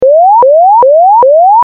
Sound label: Yelp